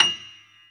yamaha grand piano

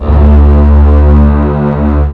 FAT BASS; SoundFactory

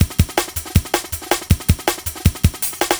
Old School Break, Drums

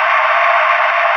noise; SoundFactory; FX

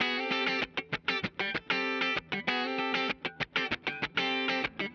Electric Guitar, Loops; Guitars

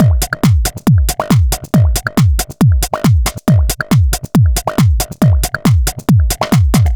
Dance Drumloop; Drums; Dance Beats

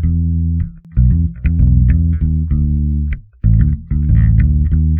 Electric Bass; Basses

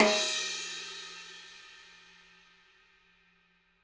Misc Percussion One Shot; Drums

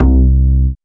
Synth Bass Single Note; Basses

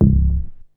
BASS; SoundFactory; SMPMANIA

